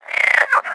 frog; World of Tanks Blitz

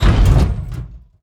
T Start Move; DCS

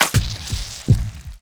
syringe inject friend; Rust

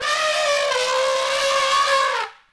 Elephant Trumpet; Civ4 warlords; Resouces and Improvements

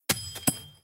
shells 20mm ground

